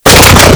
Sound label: block hit; modern warfare 2; super-mario